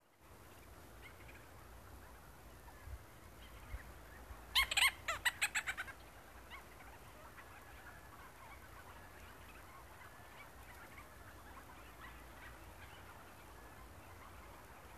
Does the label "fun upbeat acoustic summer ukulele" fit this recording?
No